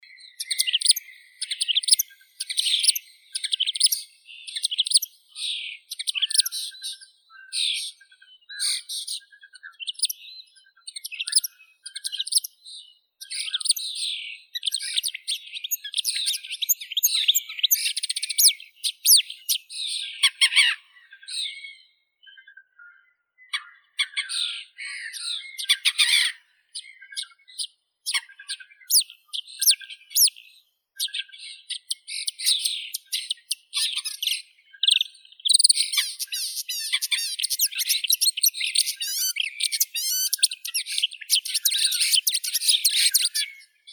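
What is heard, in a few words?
marsh warbler, Acrocephalus palustris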